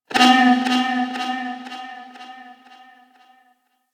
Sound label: Ship Energy Bounce; Ambience; Warframe; Corpus Ship Remaster